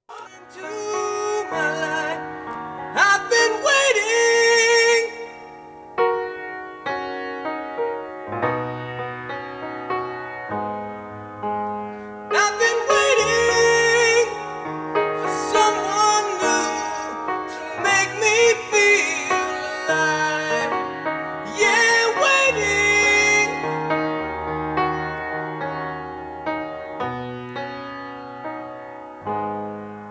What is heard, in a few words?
Piano & Vocal Mix